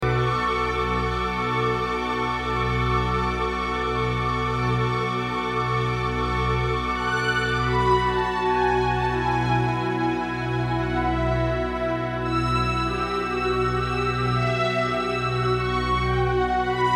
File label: stringya soundtrack